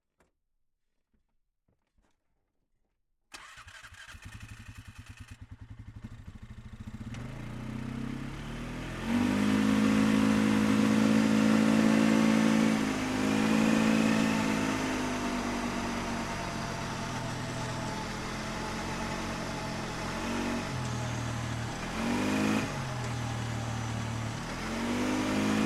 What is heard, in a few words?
Polaris Sportsman 500 - ONBRD - MEDIUM - Drive Various - ENGINE - Transmission; XP 2006; DPA4062; Pole Position